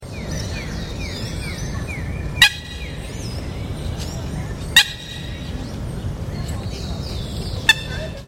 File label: Gallinula galeata; JD Bot SP